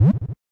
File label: shoot, Basketball; Sports Games; BasketballPractice